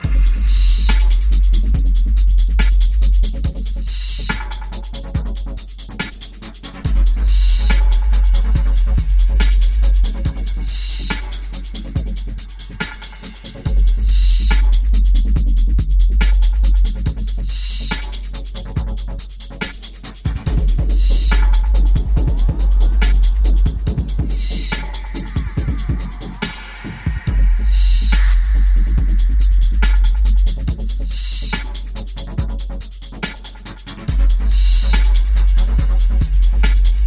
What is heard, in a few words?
DUBSTEP